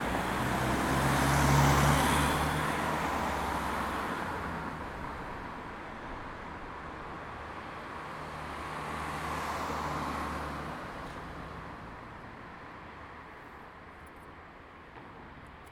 Car Traffic

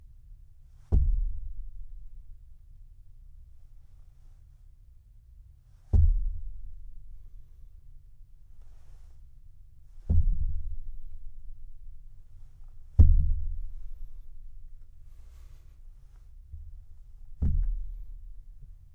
Big Basey Stomps

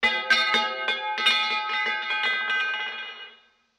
Metal Falling

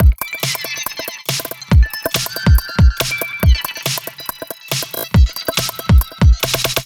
Loop 140bpm

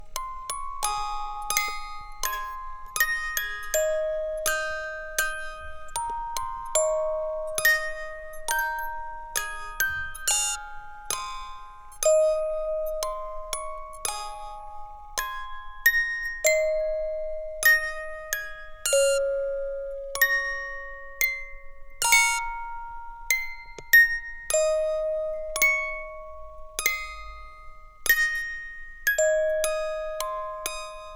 Musical Box Loop Slow

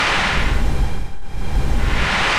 Static Wave

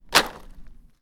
Dropping Wood

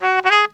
Sax note

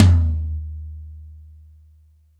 Yamaha Beech Custom Tom Low

